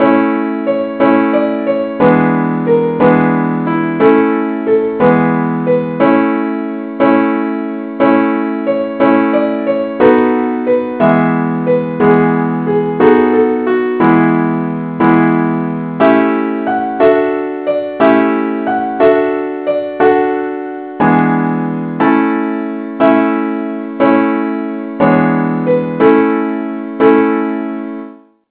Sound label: simple acc key a